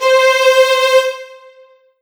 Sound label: Roland SC-88 String Ensemble C5